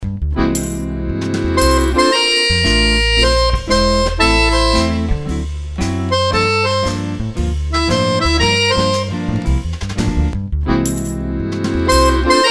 Stimmungen, accordions

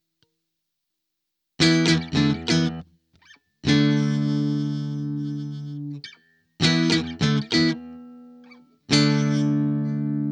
working tape gitarre

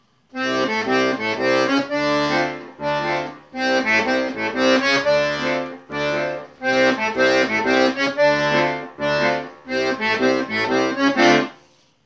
begleitschema

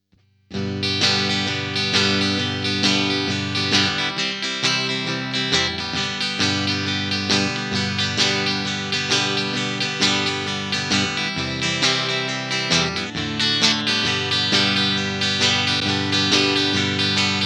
working tape rhythmusgitarre

